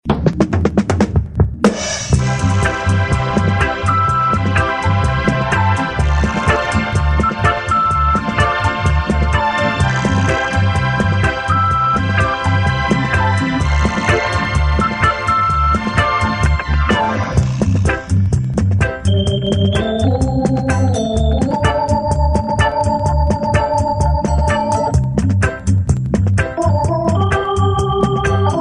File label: drum song